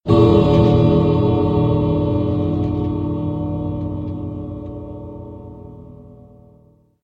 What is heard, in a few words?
collapse, ui